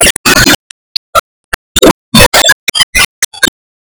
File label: Ominous Steps